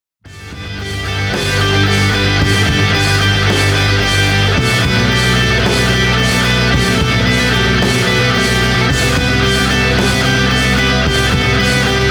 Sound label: 111bpm remix